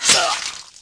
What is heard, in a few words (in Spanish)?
apunyalar